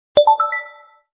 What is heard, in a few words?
Bubble Notification; iPhone